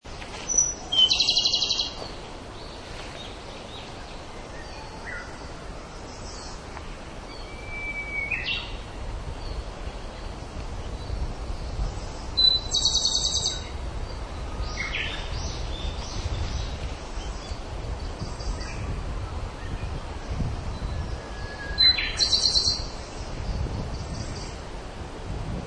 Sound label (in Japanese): tanekoma